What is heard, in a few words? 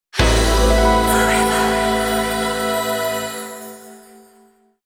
Verse Result Jingle; bayonetta 2